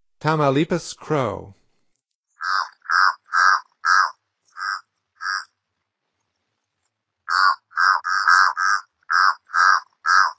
Tamaulipas Crow, Songs of Birds of North America; Stokes

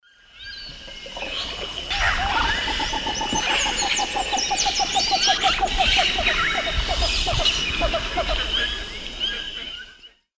Gallinula chloropus, calls; S. America; Eastern Lowlands

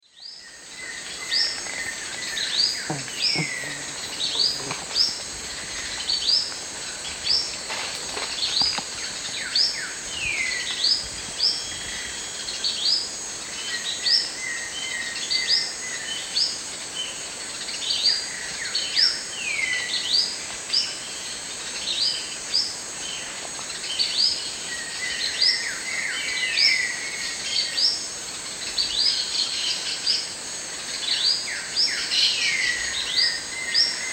Brachygalba lugubris, calls; S. America; Eastern Lowlands

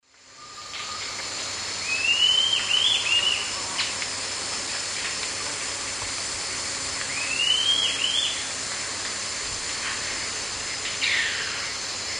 Rhegmatorhina melanosticta, subsong and call; S. America; Eastern Lowlands

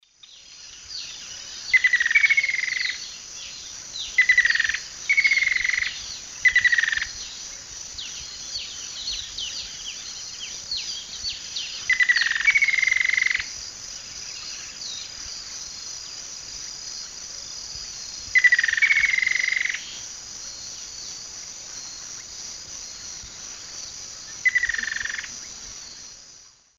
Myrmochanes hemileucus, call and duet; S. America; Eastern Lowlands

